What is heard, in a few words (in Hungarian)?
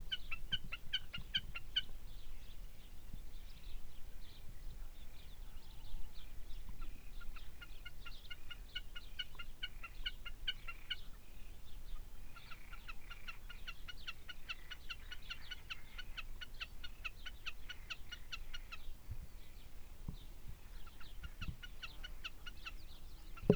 sarszalonka hangja